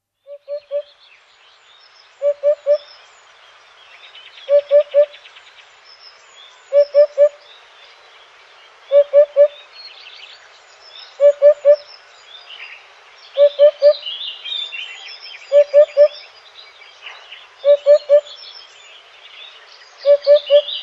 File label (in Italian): upupa